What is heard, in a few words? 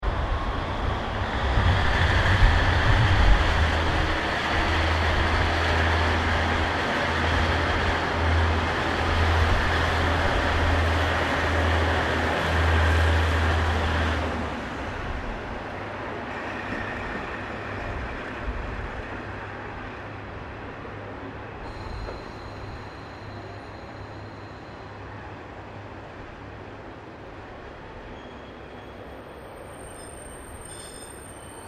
Train Aomori DC40 Passenger Diesal Passing, Japanese Trains; Vehicles